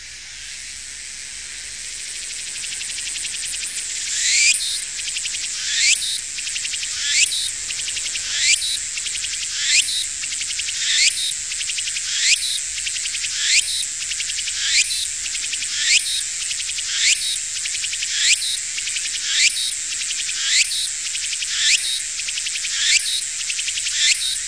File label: tukutukubousi